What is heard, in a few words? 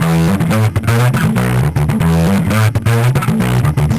Processed Electric Bass; Sonic Foundry (Sony Creative Software); Modular Electronica; Basses